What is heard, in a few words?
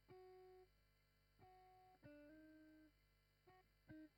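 guitar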